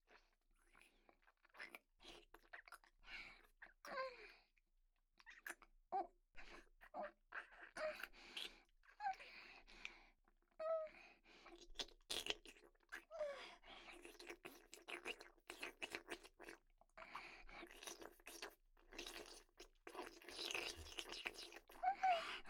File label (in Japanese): ぺろぺろ, フェラ; フェラ分割